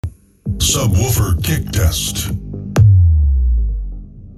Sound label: subwoofer kick test